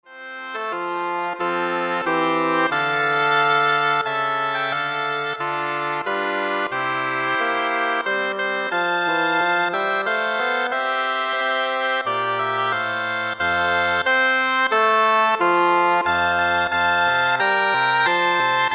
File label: Double Reed Ensemble